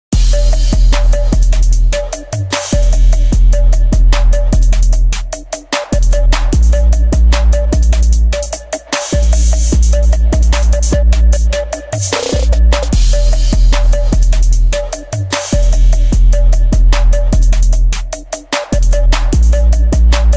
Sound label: trap music sample; TRAP Music Sample Pack